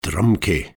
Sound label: DRUMKIE